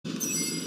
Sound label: handlink beeps